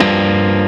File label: Arp. F#m7(11)